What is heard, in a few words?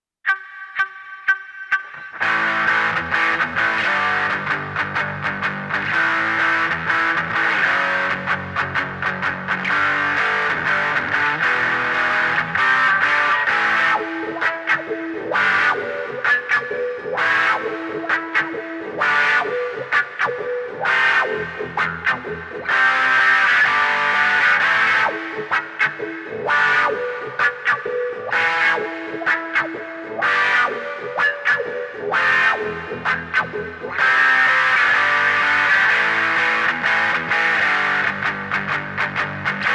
riff